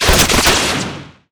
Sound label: Mgun Skin